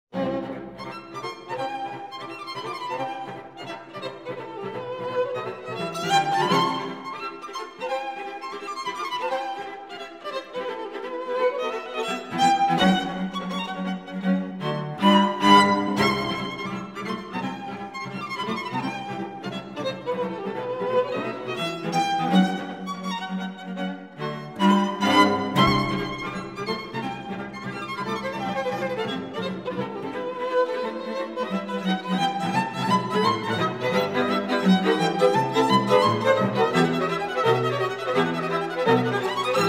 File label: quartet; Beethoven; Rasumovsky